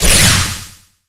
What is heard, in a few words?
thought route dash